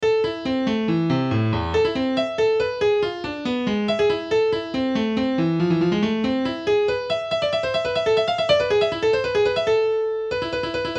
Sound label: Klave